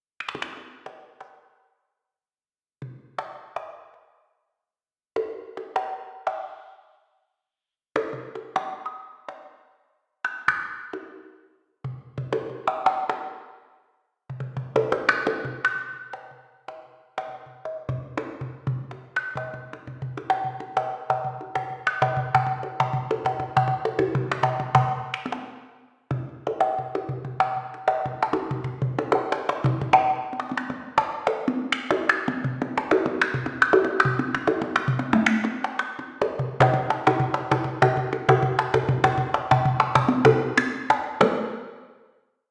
Percussion

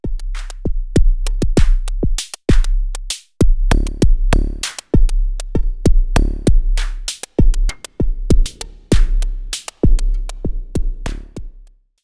Behringer Space FX Korg Volca Drum Plate

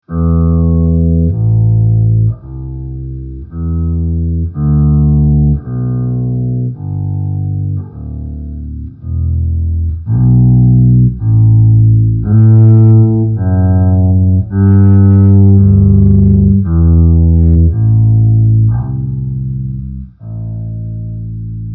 Bowed Attack Time

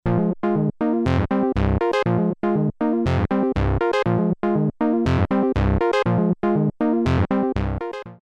Korg Monologue Duophony

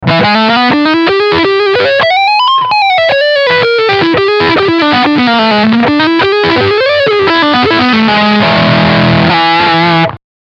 Lick Distortion Front PU

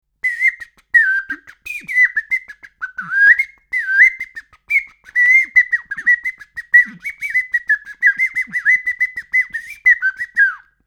Ocarina AT4080 mono

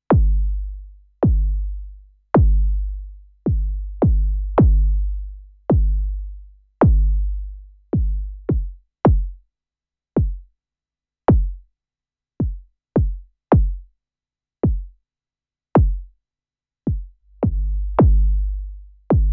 Kicks; DFAM vs Edge

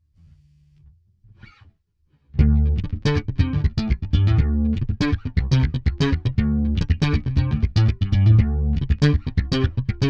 Slap POP MUSIC

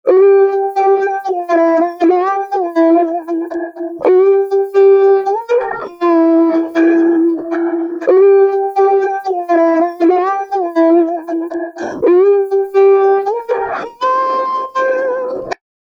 Rhodes Piano

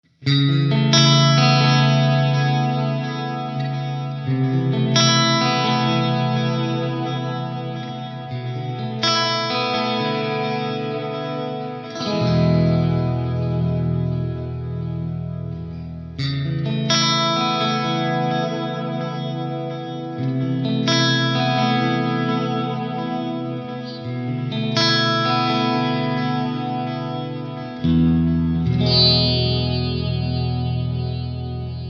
echo true spring

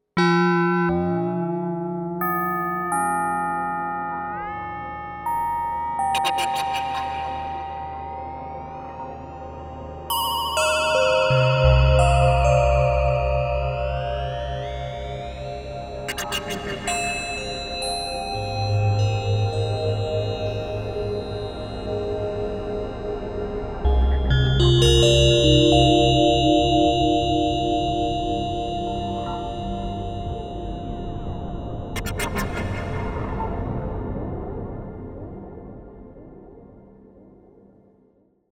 Montage

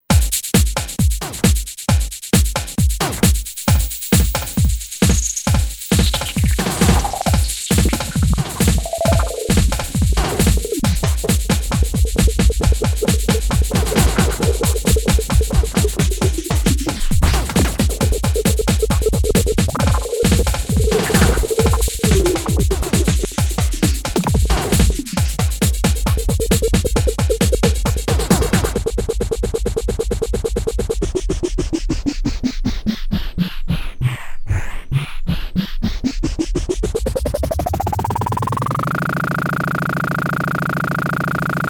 Delay Beat

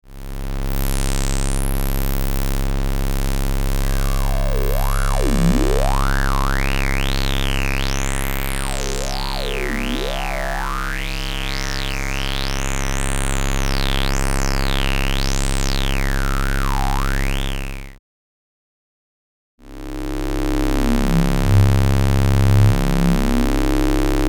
EMS Logik

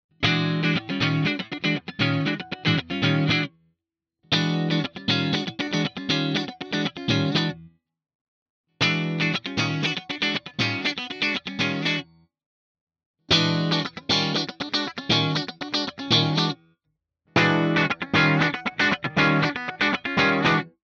clean Funk all positions; Jackson PRO PLUS Solist SLA3

